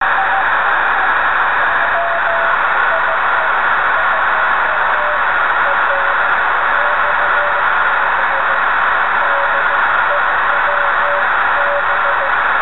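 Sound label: EME 3.4 GHz cw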